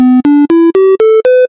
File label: pentatonic scale